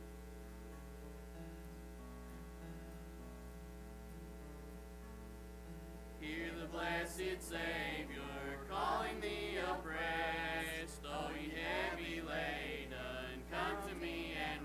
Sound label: singing Family